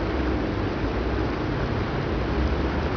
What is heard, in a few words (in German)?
Fluss; World Racing 2